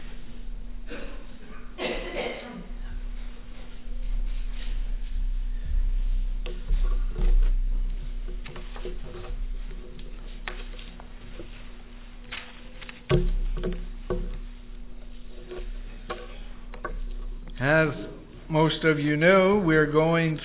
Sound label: Caryllon Bells Mystery Musical Feng Shui